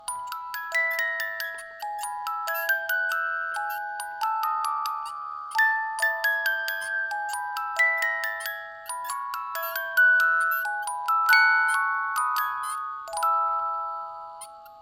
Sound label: Music Box Loop